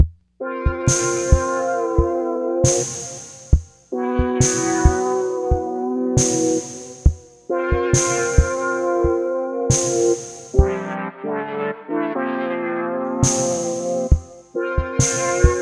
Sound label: Mines; High On Life; Zephyr Mines B